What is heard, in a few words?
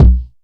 tek kick; Drums In Your FACE!